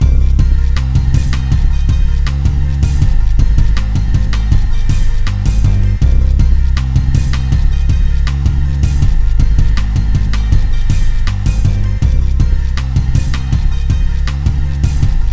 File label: Complete; Hatchet Ninjaz; OpenBOR